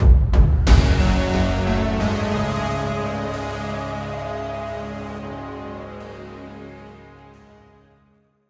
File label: Lose Stinger; Axon TD Uprising